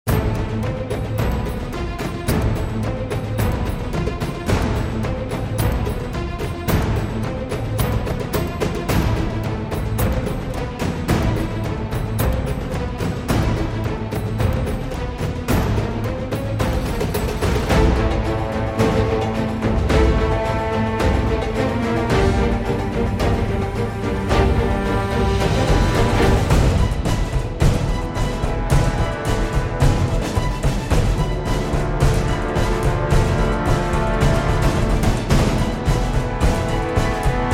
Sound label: bgm pirates cave; dragon nest; stage3